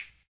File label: CLP, CLAP; ILIO - Ethno Techno